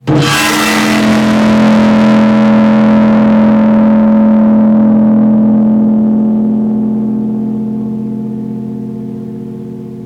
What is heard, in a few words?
Musical Stinger, Jump Scares; 344 Audio